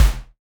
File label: kick deep puff; sys100